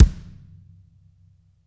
BD ROOM, bass drum; BASSDRUMS

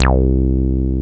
TB BASS; TB-303

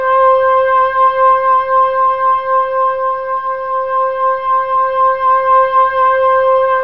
OBO PAD, ATHMOSPHERE

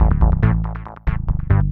SNTH BASS ELCTR 140 A, Techno

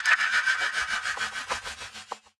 Hat; Touch Loops; BlissedOutBeats; One Shots; Drums

